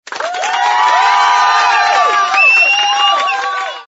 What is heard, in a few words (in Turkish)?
hafif tezahurat